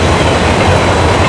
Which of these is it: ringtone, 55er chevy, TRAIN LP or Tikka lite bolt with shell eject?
TRAIN LP